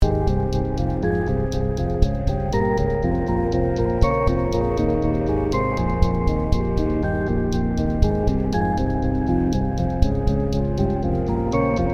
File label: strings